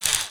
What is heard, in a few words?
head twitch, npc dog; scripted